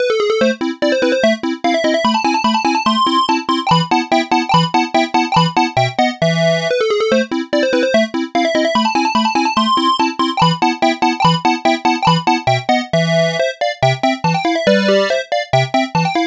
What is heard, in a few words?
square lead